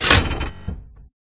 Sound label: Mechanicals big